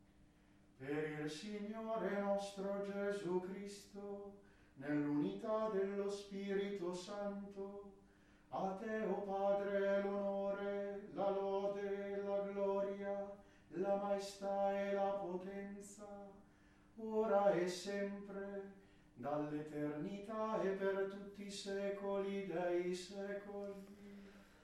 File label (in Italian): Dossologia; pastorale liturgica